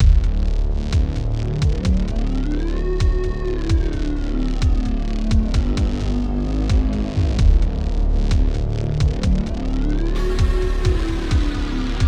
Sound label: 130bpm driving breakbeat